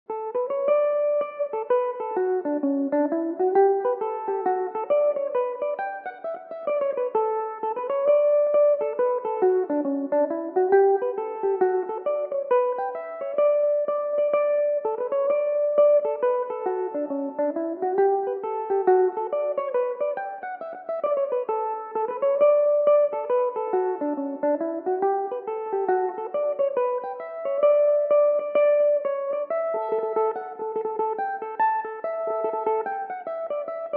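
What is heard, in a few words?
hornpipe D major belmuse, irish mandolin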